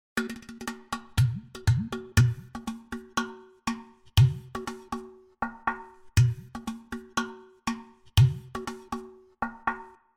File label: Flat Udu